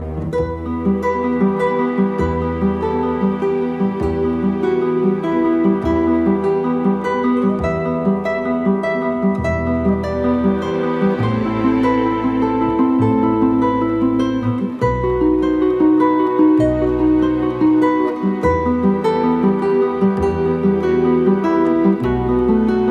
Classic Guitar

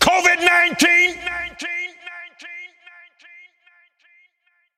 Wind of God Remix

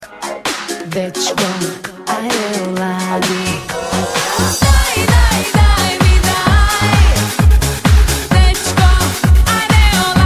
Croatian Dance Mix

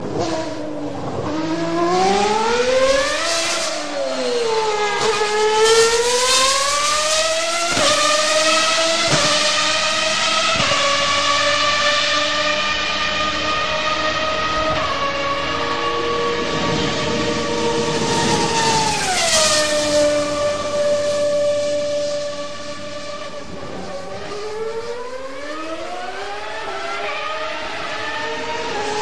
Ferrari V12

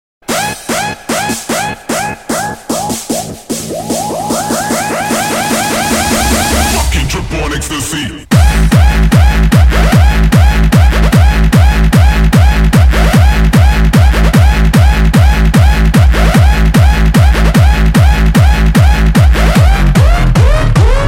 Electronic

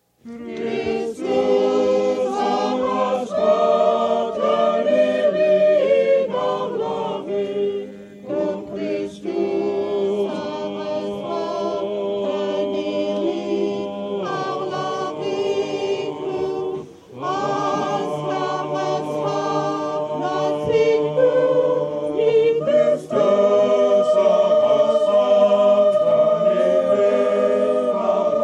Easter Song

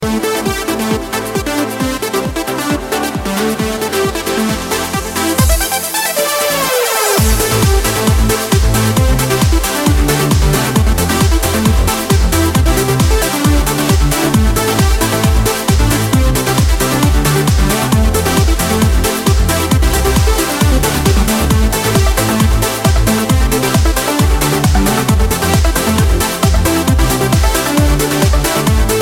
Arcadia Tone

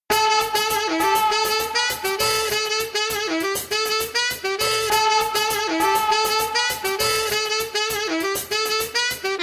intro horns

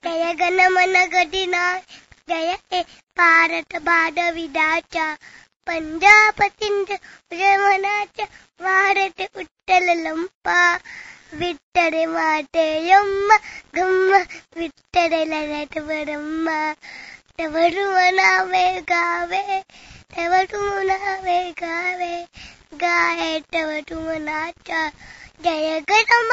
Funny Song

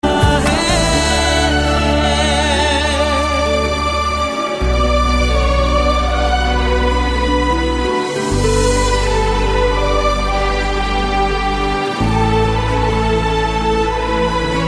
Piano Tone

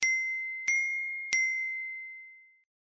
iPhone Tone Note; iPhone 3

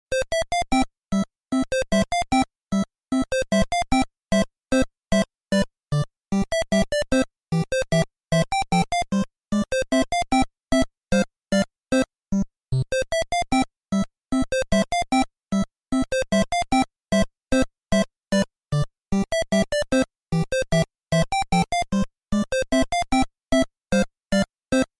Saint Go Marching In

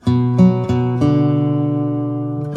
Riff

